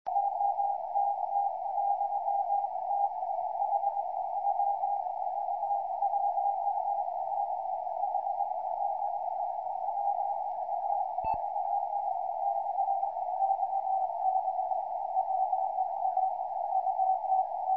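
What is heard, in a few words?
CW qso, 432 MHz, amateur radio; PA3COB JO32MF 568; qso PA3COB - OK1TEH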